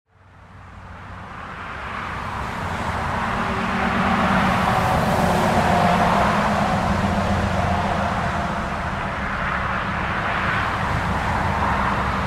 highway sfx; افکت صوتی

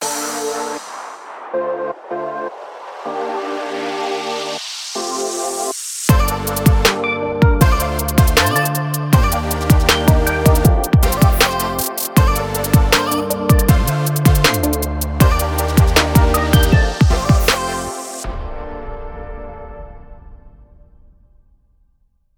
audio logo; tubebackr-moonz-audio-logo

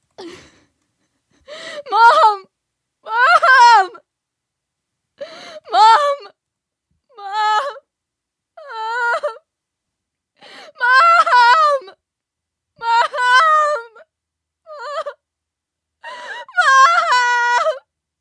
woman crying

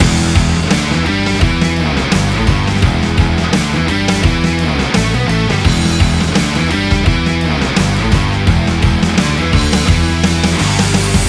Powerful Rock Intro Trailer Ident Loop

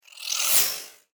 Woosh dry; افکت صوتی

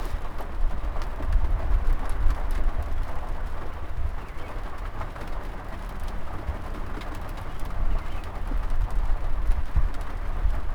rain; افکت صوتی

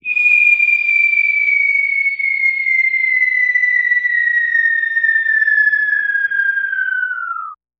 bomb whistle

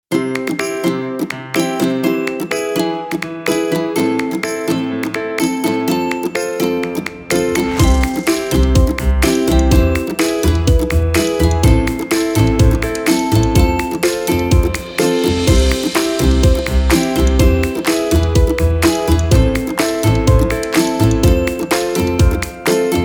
be happy

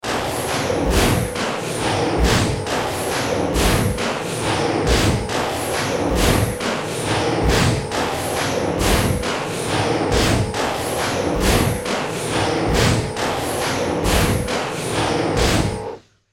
Robot army; افکت صوتی

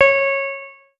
notification mobile

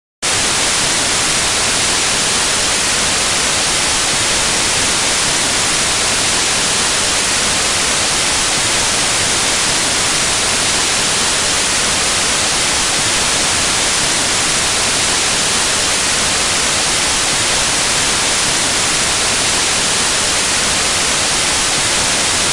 White Noise Sound; افکت صوتی

